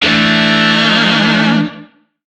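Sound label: Shawzin Pentatonic Major Chord; Warframe; Lizzie Shawzin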